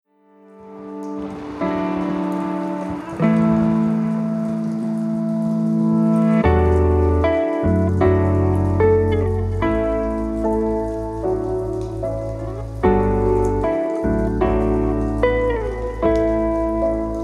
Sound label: rain; folk